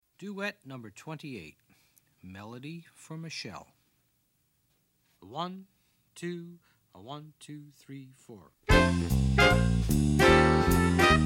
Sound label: Backing Track